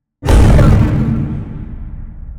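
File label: pile distant, construction; ambient; pile samples; Half Life Alyx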